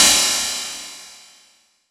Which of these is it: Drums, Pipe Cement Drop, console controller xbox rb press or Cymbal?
Cymbal